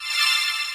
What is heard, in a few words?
heal